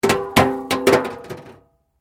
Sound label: Metal Light Movement Minor